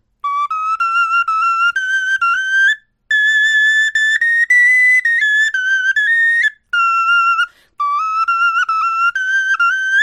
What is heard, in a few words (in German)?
melodie